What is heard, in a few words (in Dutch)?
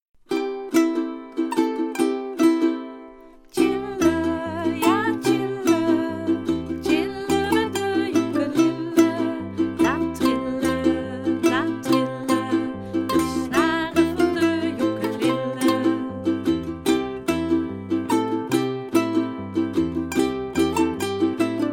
Chillen met de ukelele